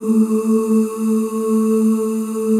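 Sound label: A2 FEMALE OOS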